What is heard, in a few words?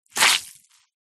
foley wet cloth squeeze squelch rinse; zapsplat